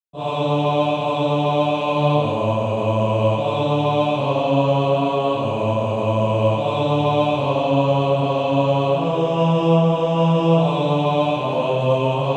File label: Male Choir; Baritone